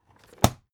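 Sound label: aluminium case small close short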